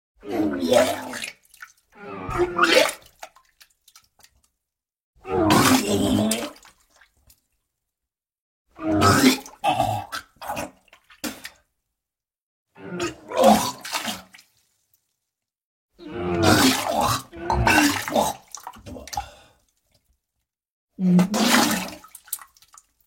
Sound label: Vomiting